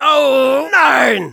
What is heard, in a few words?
Soldier negative vocalization, de; "Ohhh nein!"